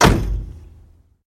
Chevy Car Door Close

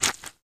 Grapefruit Being Sliced By A Knife Reversed

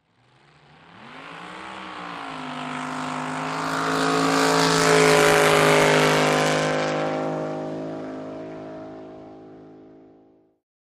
Drag Boat Start by

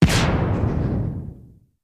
Fiery Metallic Expl, explosion

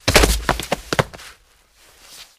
Body Falls On Cement

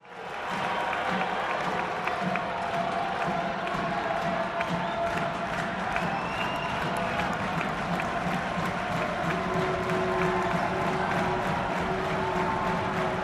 gym crowd cheer, cheers

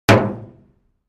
Dumpster Type 1 Hit with Sledge Hammer

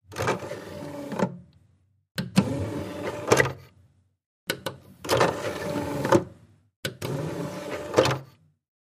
Player Video DVD, Door, Open, Close x4

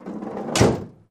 Cutlass 80 Hood Close; trunks & hoods

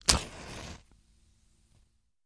Match Strike Ignite

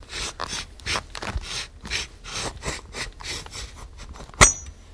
Bike Pull Seat Out

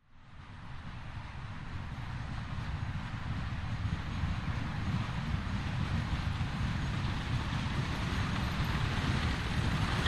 Carriage 4 Wagons

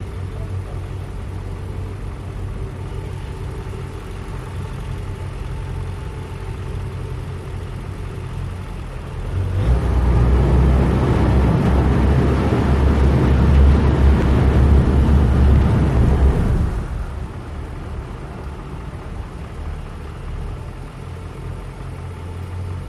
Boat Engine Idle to moving

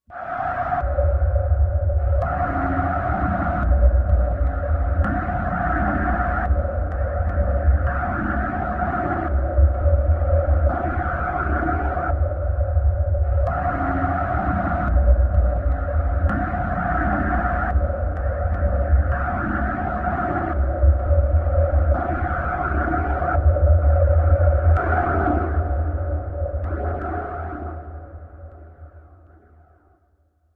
Aqua Lunge Machine Bre; airy

